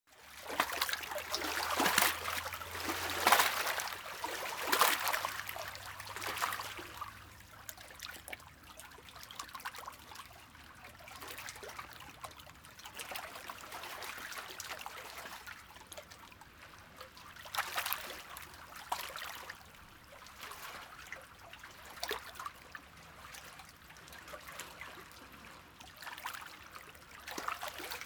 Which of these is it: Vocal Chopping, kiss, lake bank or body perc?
lake bank